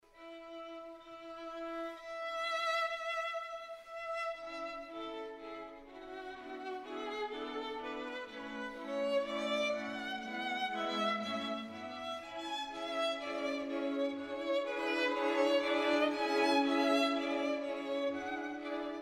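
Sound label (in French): quatuor saint-saens quatuor n°1 - iii molto adagio; Quatuor Tchalik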